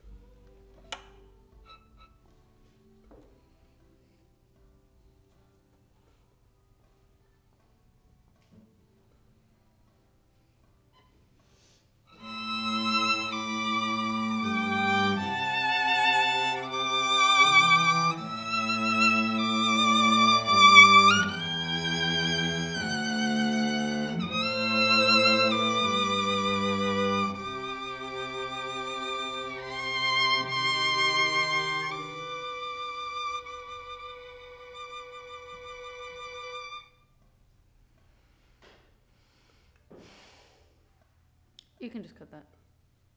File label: Violin